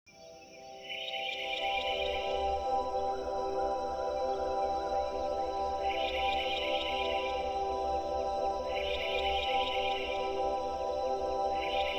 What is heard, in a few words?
ambient